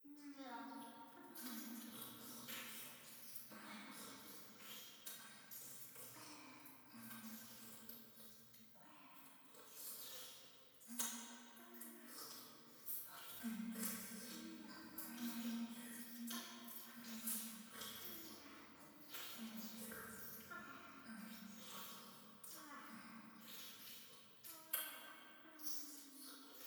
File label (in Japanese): トイレ フェラチオ